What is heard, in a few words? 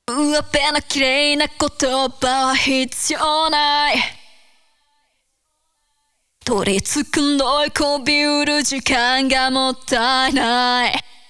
Chorus